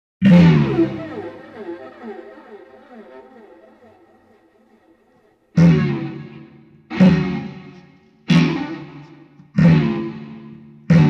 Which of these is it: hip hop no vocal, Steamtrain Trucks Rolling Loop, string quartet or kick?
string quartet